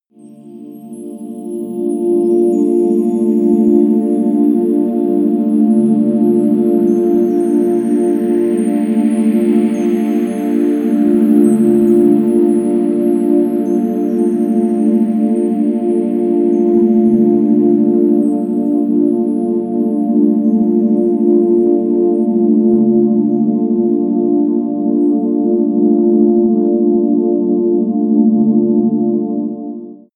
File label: space stars, music elements